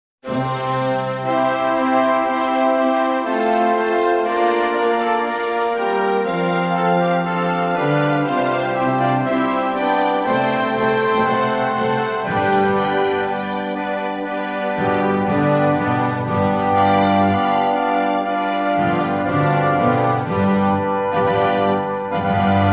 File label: Instrumental; Mozart; Mass in C Minor; Jesu Christe